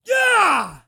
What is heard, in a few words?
voice attack male; Blind Gladiator